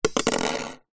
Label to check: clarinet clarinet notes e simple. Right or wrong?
Wrong